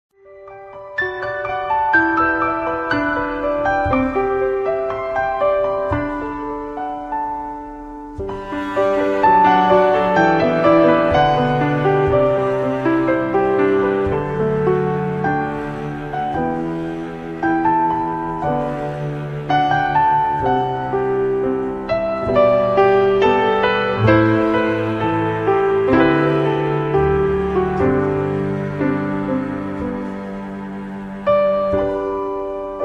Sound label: Instrumental Mix